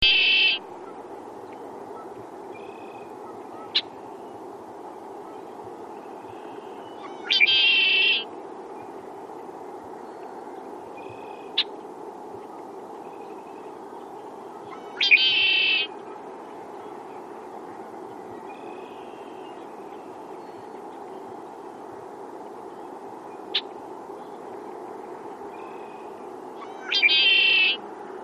redwing call

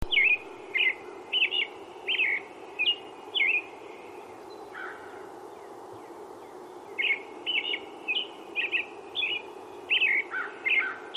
robin

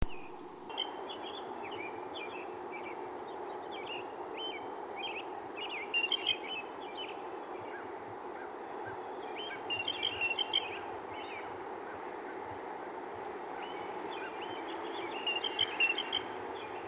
blue jay pump

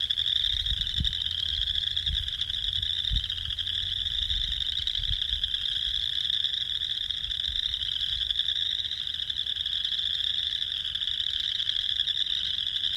chorus frogs

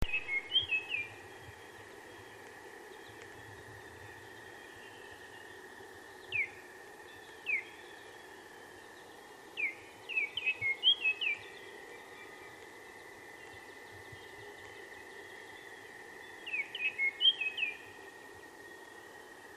oriole

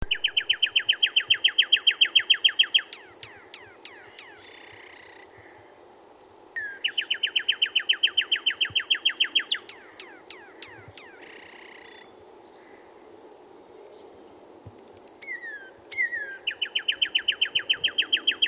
cardinal

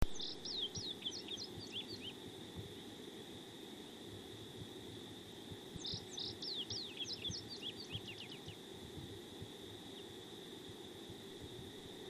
indigo Bunting

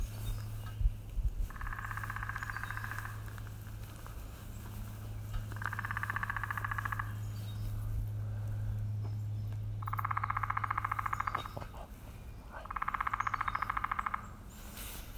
Woodpecker

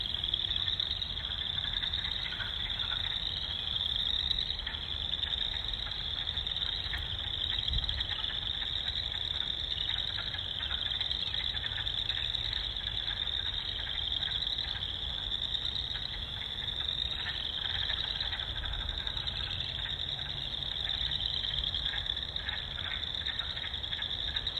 woodfrogs

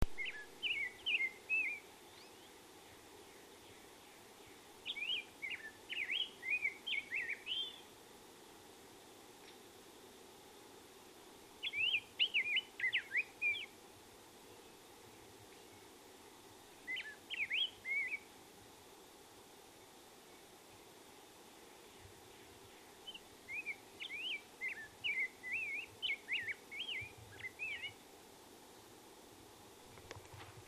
grosbeak

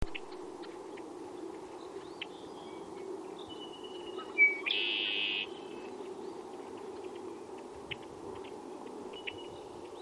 redwing

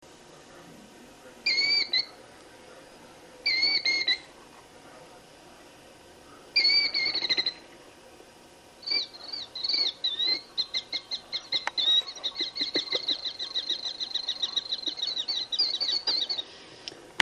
killdeer

